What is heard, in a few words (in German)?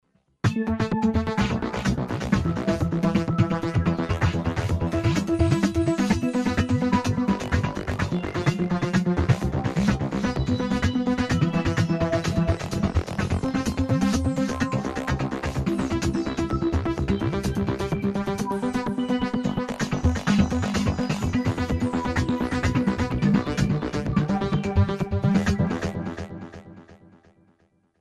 Sound, Synthesizer; virusc; 80er Jahren